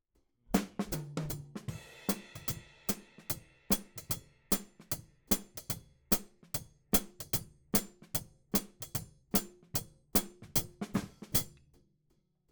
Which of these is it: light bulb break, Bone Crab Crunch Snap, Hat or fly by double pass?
Hat